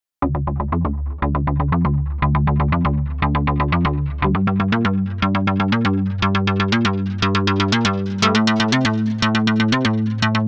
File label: FM Arp